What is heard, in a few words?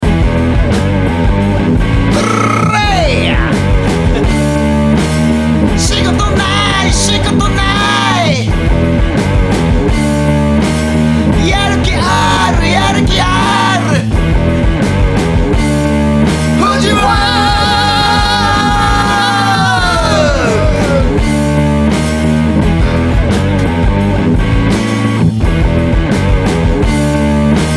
rock